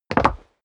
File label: step leather shoes wood parquet jump; schritte